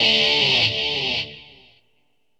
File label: GUITAR, ROCK POP; MAGIX